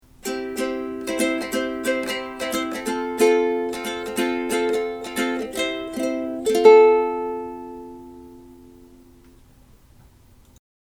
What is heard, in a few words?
ukulele